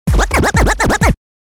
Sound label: Scrub It Up; DJ sounds